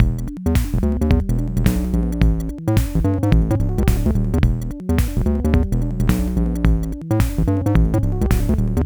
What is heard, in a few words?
adlib loop